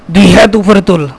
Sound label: hat